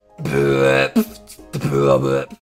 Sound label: beat box